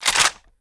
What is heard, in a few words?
hk417 clip out, weapons